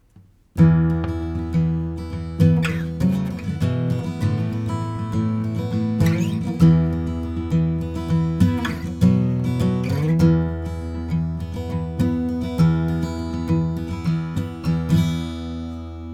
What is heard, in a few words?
AC Guitar; 500 series mic preamp; bizzar audio mint julep; Green